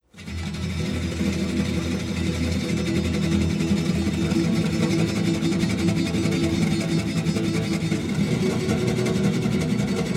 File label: Rumba